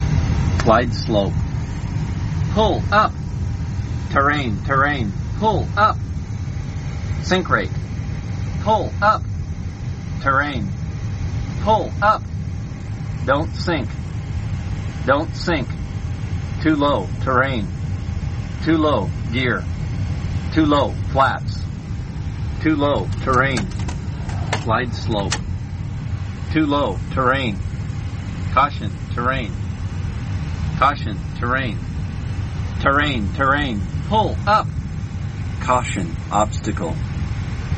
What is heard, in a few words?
A320 GPWS